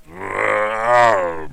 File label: growl; shamblie; npc